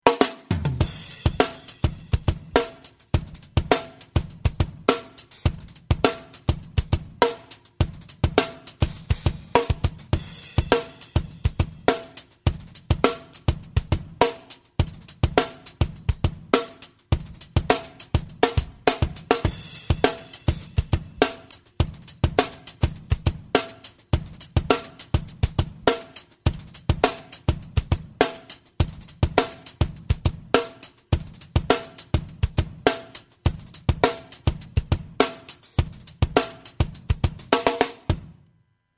LED Bias High

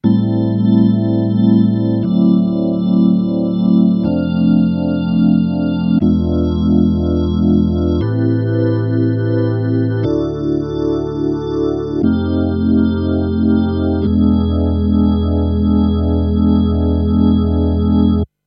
E Organ Neo Ventilator II

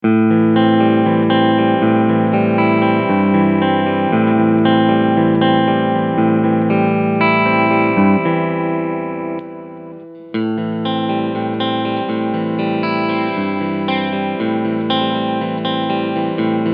R 446 Alle Clean Sounds; Harley Benton

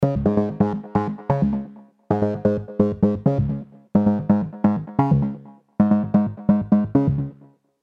Tape Fat Analog; Arturia KORG MS 20 V; MELLO FI

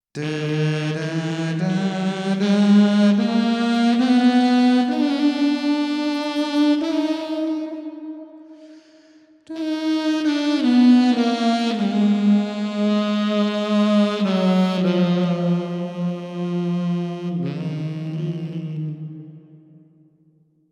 Voice Prophecy Sax

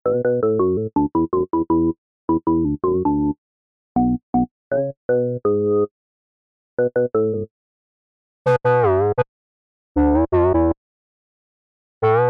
Vacuum Bass

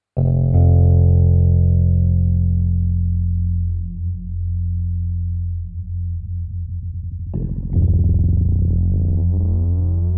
Phaser LFO Modulation

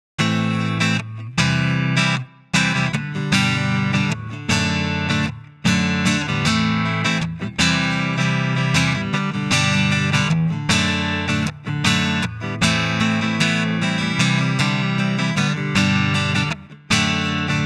Acoustic Clean

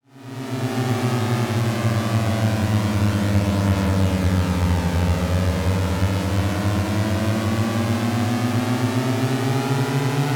Siren Rnd Swarm; Mob Of Emus

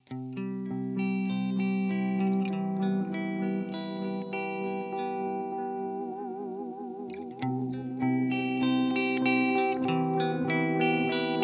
Teisco Delay Modulation

